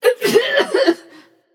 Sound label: clear throat passion; chaac